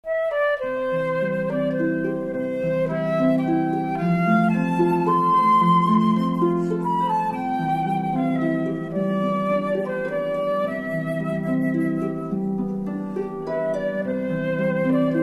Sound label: duo, harp